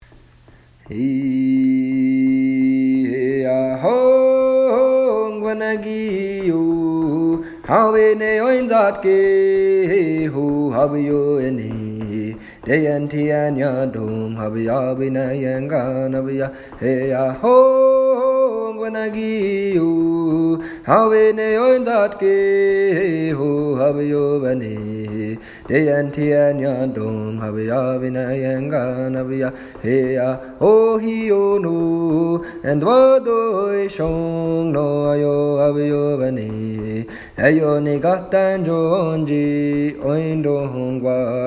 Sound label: Water Song